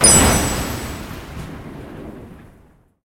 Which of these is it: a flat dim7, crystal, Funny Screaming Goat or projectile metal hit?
crystal